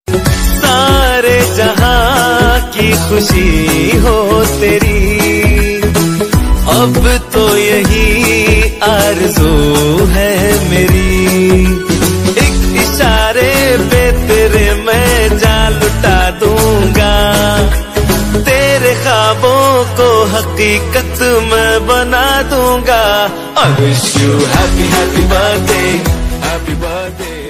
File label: happy happy birthday hindi